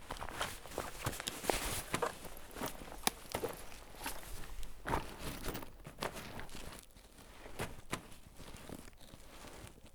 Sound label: body extended; Escape from Tarkov